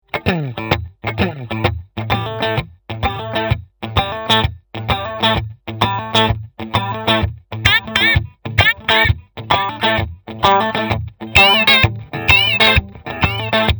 Solid State Warble

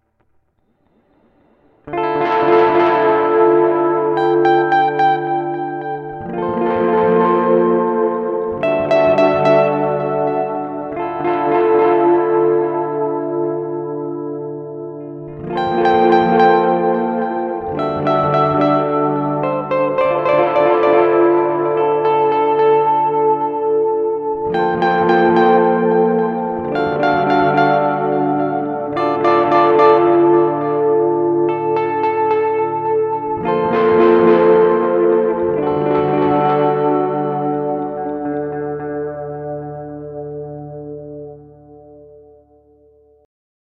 drum

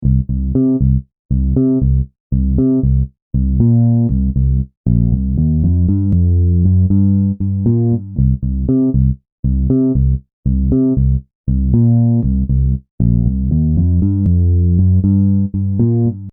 Bass 1176

